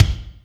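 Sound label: kick Amb Thud, Alesis D4; 300 Drum Machines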